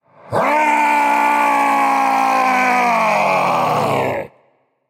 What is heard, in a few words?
battlecry; fenrir